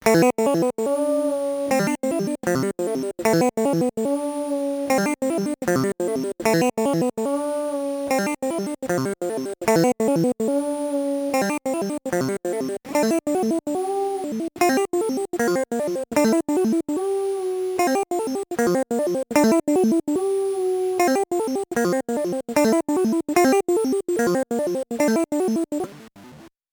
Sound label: Pitch Sequencer; Ottobit